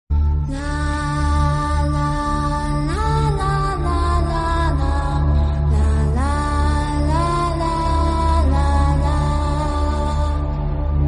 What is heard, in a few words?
bgm ringtone; Forensic; psycho killer